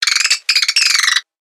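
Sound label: Pets Insect Large Ecstatic; creatures; Lego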